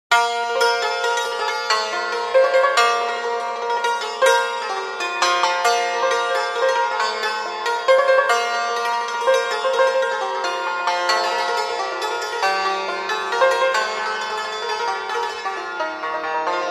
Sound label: Santur